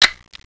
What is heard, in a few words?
Nikon F2A mirror up